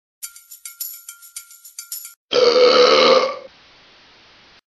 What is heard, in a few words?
BURP